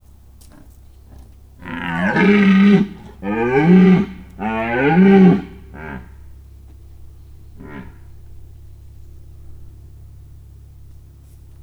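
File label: red deer, quiet motor aircraft; budakeszi zoo 2010; gimszarvas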